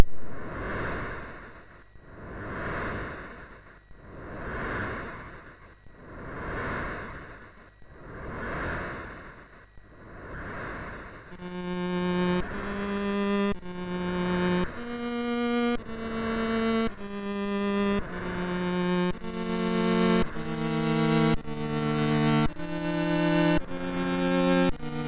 Beach, MOS6581R2

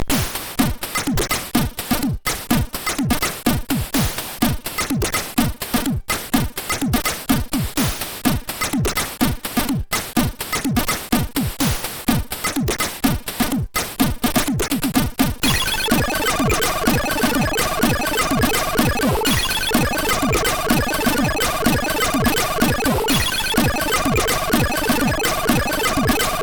Bass and Drum, sid, 8580; CSG8580R5; hvsc

